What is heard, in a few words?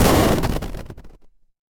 retro game explosion